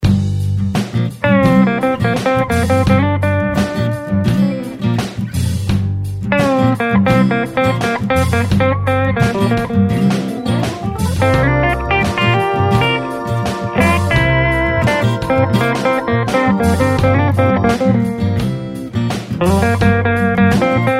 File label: Style Blues In G 85 Solo Guitar; Blues Solos